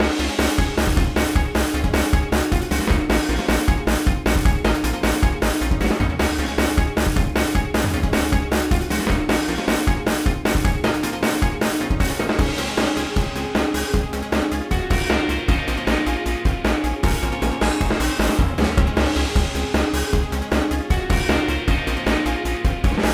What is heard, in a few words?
GARAGE ROCK DRUMS SAMPLE SONG